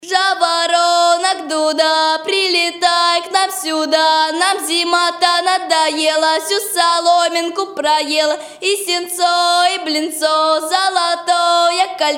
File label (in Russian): Жаворонок дуда; Хотьковсктие затеи